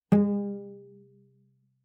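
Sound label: Cello pizz fortissimo sul G G2; MIS Pitches - 2014; Strings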